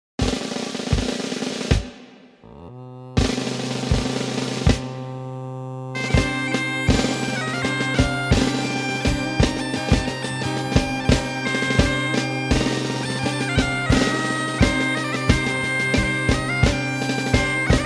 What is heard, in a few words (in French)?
instrumentale